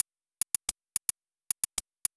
Fill, Drums, Eclectic Electronica

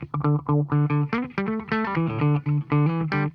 BACK 2 BACK, Funk Guitar; 90 s Sample CDs; Zero G

